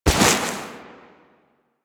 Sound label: Step Snow, Footsteps